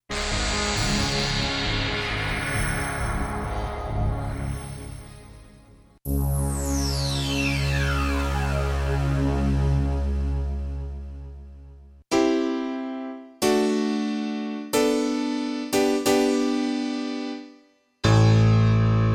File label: JD800; Preset